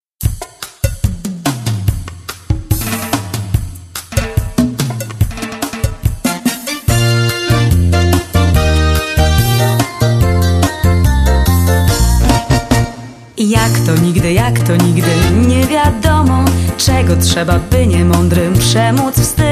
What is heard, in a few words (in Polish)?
cha cha